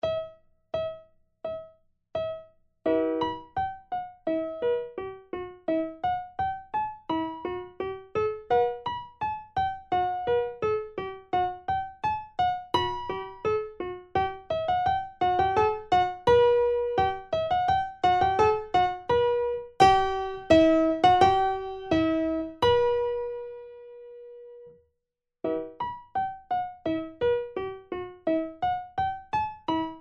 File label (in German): Primo, Vierhaendige Uebungsstuecke; Playbacks; Diabelli